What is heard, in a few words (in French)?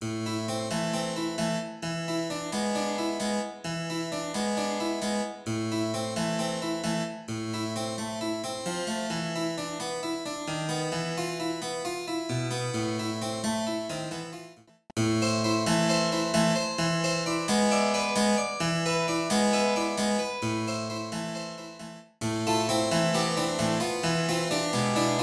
Suite pour 2 clavecins, Milongalice; Traditionnel